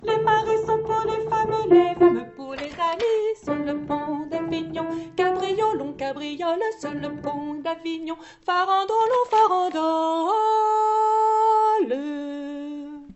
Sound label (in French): Basses; Le Sire de Vergy; Les maris